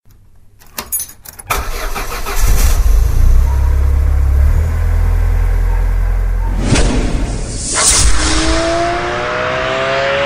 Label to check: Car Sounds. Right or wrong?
Right